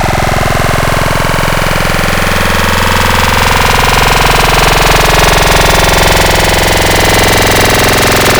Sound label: Growl Table, Serum; Cymatics